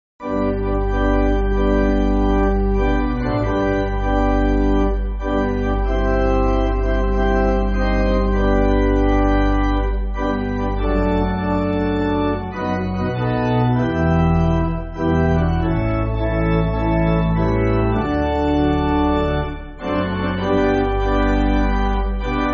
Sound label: Pipe, church music